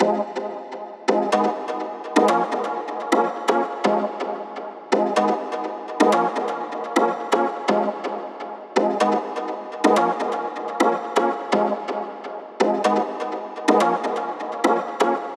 Synth Melody 125bpm G house retro

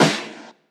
Snare

